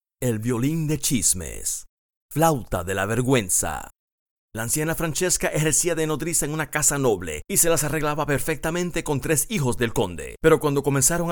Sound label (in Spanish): VIOLIN DE CHISMES FLAUTA DE VERGUENZA